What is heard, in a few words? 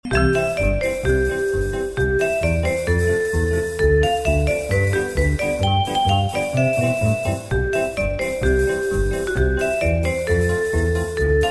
jingle bells ringtone